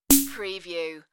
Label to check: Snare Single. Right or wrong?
Right